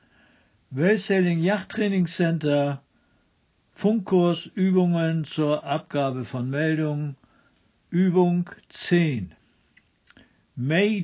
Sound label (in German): relay, Funk